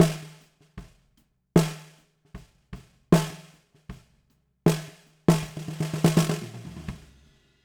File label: SNR B